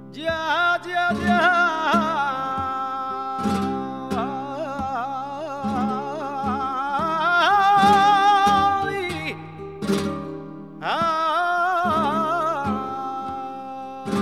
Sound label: flamenco